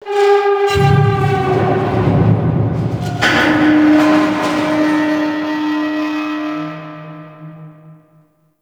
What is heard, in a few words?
Metal Groans; Ambience; Warframe; Entrati